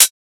Closed Hihat; Techno; Drum One-Shots; Ghosthack; Free Techno Drum Shots 2024

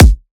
Riddim Kick

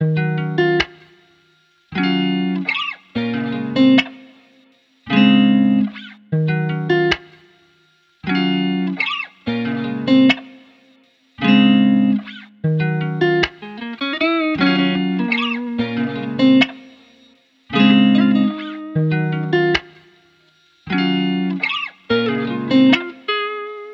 152 BPM A# Min, Guitar Loops; Tie The Knot; Cymatics; Journey Guitar Loops

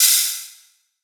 Ride; Hats and Cymbals

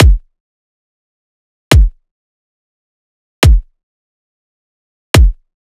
Riddim Drum Loop Kick (140); Drum Loops; Ghosthack; Riddim Essentials 2020

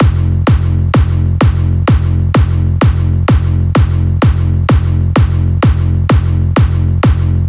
Bigroom F 128bpm; EDM Drums; Drum Kits; Stems; Ghosthack; Ultimate EDM Drums; BigRoom Kit 02

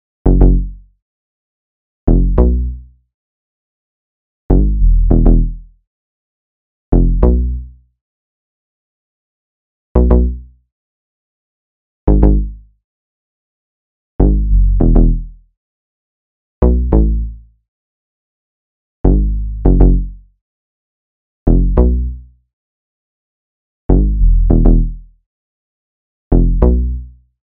99 BPM C# Min Bass; Cymatics; Monogram

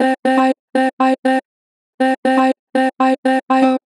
Chop - 2 bar vib, Natural Vibrato, Vocals; Harmor Vocals

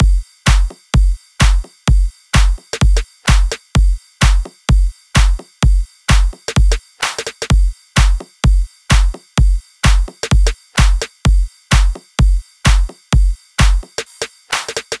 Drum Loop - 128 BPM, house full drum loops; Code Red; Cymatics

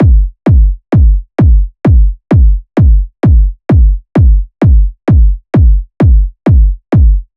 Kick Loop 130, Techno

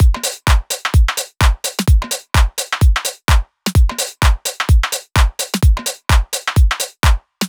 House Full Drum Loop, 128 BPM; Drums; Loops; Cymatics; House Starter Pack

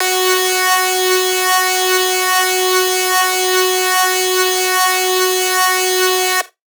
Lead Shot F# Broken, Dubstep; One Shots; Ghosthack; Dubstep Sound Arsenal

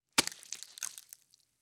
Bug Ant Crush Squish; Fairlight Sound Lib

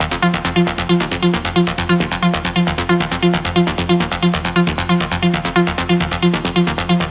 Synth Loop D# 135, Techno; Ghosthack; AC2024 - Day 1 - Techno Sounds 2025

